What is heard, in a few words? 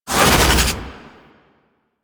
Fairy Flight Cast Air; DE; Warframe